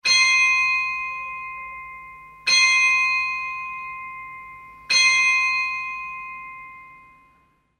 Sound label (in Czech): zvon; vera; zvonohra